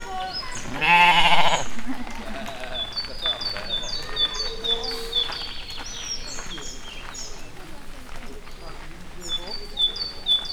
két kecske, riasztóhang madártól, látogatók, házi kecske; miskolc zoo

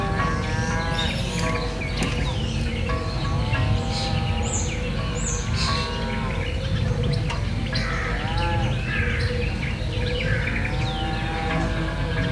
este behajlóan, őstulok; Hortobágyi Nemzeti Park

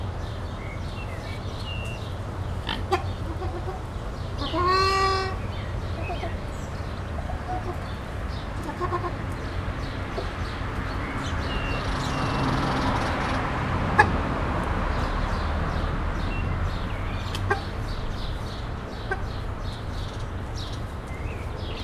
közút közelében, vörös ásólúd; kecskemeti zoo 2016

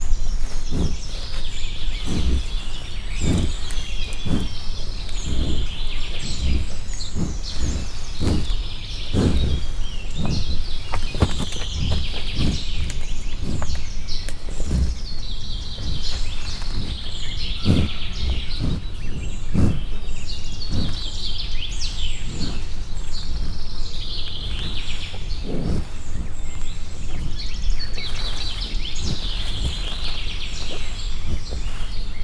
orron át lélegeznek, európai bölény; miskolc zoo

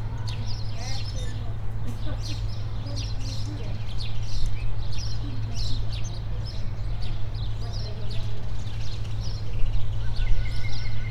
verebek, zöldküllő, szirénázó jármű; debreceni zoo 2012; házi veréb

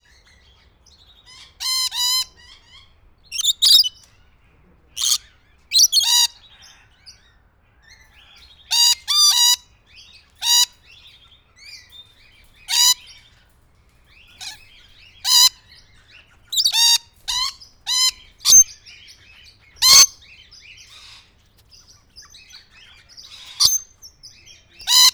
szivarvanyos lori; állathangok; nyíregyházi zoo 2010

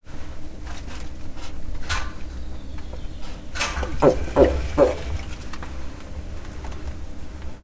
terrariumban megpiszkalva, okorbeka; miskolci zoo 2009

